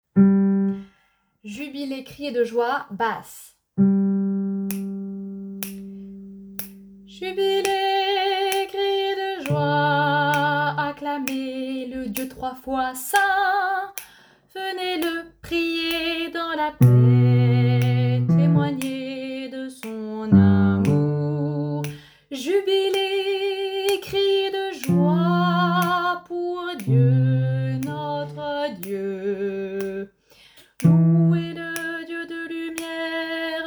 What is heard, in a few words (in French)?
Jubilez criez de joie basse